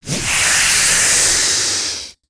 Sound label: Pets Tortoise Angry; creatures; Lego